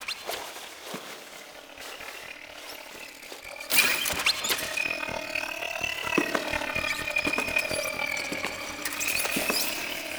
Free Loid Cinematic SFX; Warframe; Entrati Labs

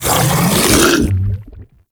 Grendel Bowl Devour; Warframe

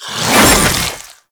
Whoosh, Weapons; Infested; Coda Pathocyst

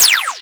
Fire 2D Shot, Weapons; Warframe; Boar; Boar Prime

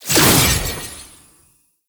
Summon Rune Pick Up; Warframe; Uriel; Vythelas

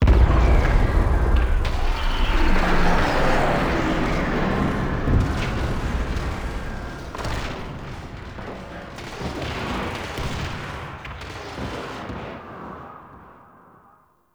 Ambient Battle Distant; Warframe; Tau

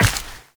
Step Ice, Footsteps, Walk; Warframe; Tenno

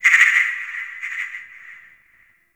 Forest Distant Frog Call; Ambience; Warframe; Grineer

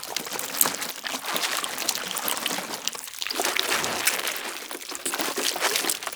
Rupture Loop; Kahl; Warframe; Corpus; Arachnoid Hunger

